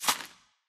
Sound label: winter tile drop